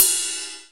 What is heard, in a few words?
d#2, DRUMCOMPUTER, ALESIS HR16; Best Service ProSamples vol.55; Retro Sampler